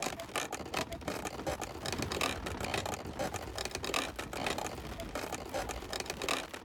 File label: Sign Flap; The Town Levels 7 10; Devour 2021 gamerip pc macos